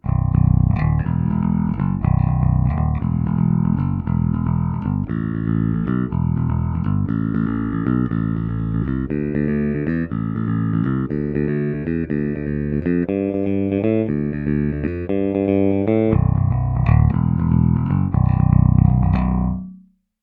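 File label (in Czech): S-S trsátko, poniklovaná ocel, Roundwoundy, Schecter SLS Elite-5 baskytara; Dunlop DBM 45 - 125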